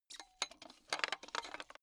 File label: metal pipe handling low short